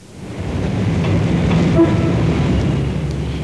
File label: CAR; 特酷声音素材库; 交通; 车